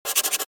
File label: Key Tone Star; LG SV850 Wine 4